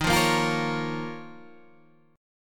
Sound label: D sharp 7th Suspended 2nd